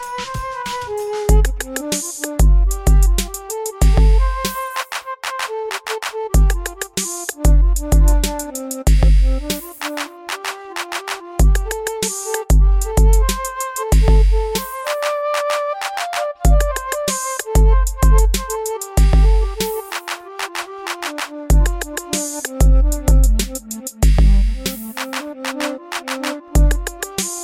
Carnatic Flute

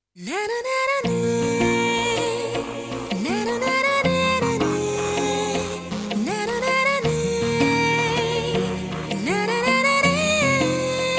Female Humming

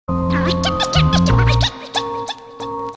Sms Dj Beating

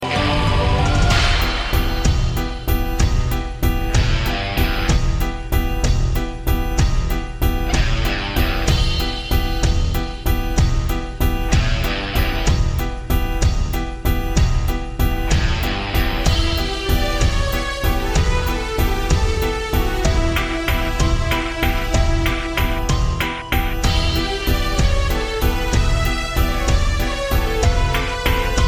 Waltz

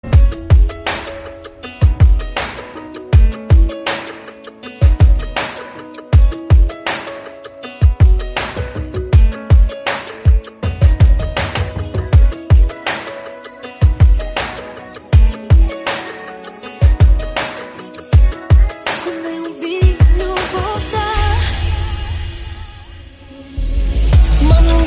Melodic song

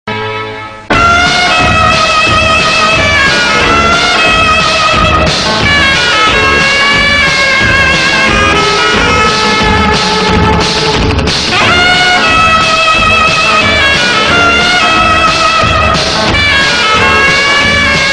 Love tone

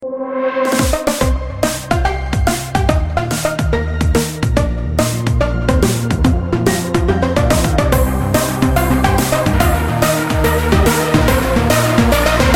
Techno Mellow; meloboom

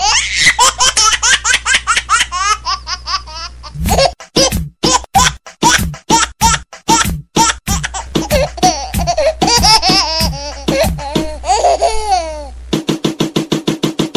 Baby Laugh Rap

